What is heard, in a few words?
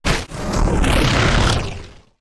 wooshes transform sweetener; Whooshes